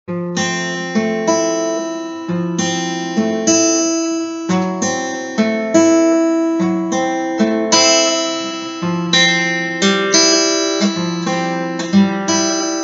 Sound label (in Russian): сэмпл Bpm 105